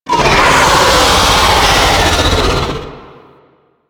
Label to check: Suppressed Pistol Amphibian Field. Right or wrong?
Wrong